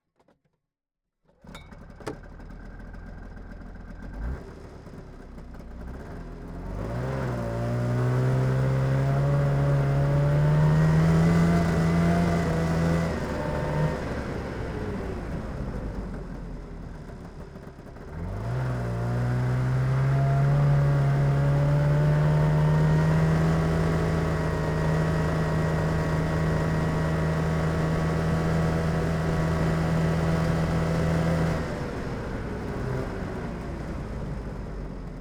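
Yamaha, ET, 340, Onboard, Start, Medium, Drive, Stop, Off, Engine, Intake; DPA4062; 1978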